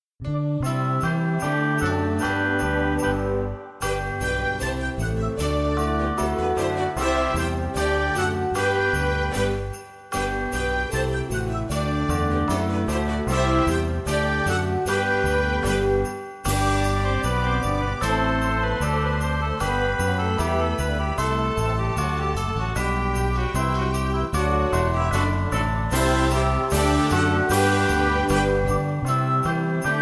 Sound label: Dynamics MINUS; Ding Dong Merrily On High; xmas